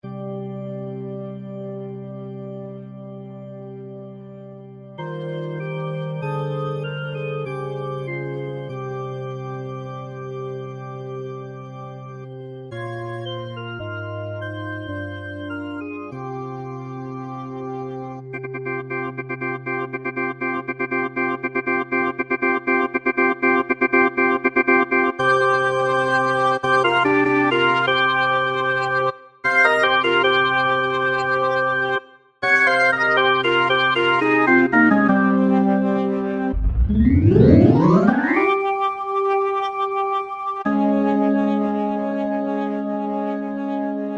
intro cover syntheway master hammond b3 organ software; vst plugin